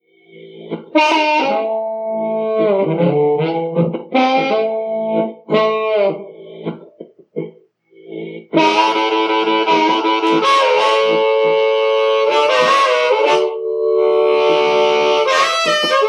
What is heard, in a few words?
jam, vib; Rp500; Lucky 13; GA40